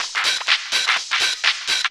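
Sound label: drum loop 125, hh+filterloops; Vocal House; PS-28 Vocal House Samples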